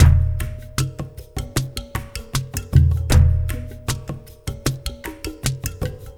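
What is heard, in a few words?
ETHNO right; Spectrasonics; Liquid Grooves 1 & 2; AF.PLANET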